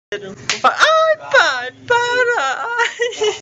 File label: knife; cstrike